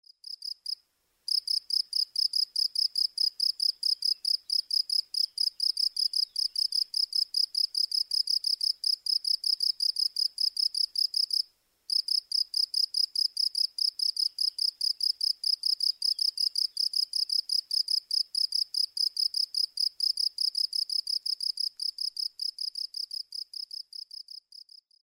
field cricket

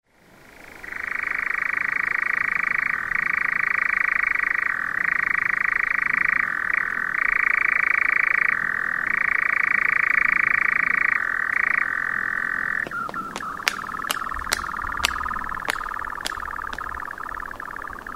nightjar

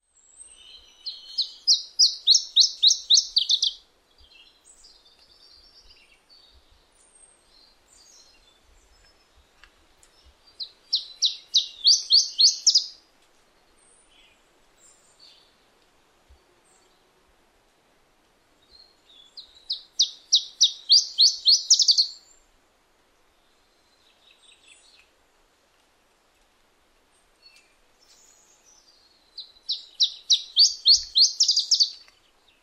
iberian chiffchaff

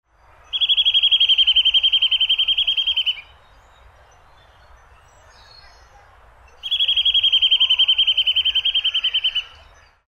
nuthatch

